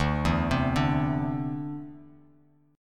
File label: D 7th Suspended 2nd down, chord; D7sus2 arpeggiated